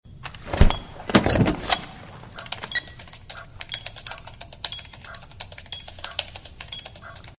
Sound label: planting defuser; r6